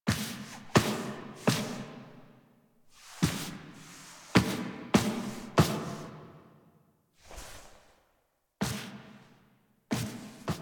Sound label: Foley; Zariman; Table For Two